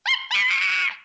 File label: monkey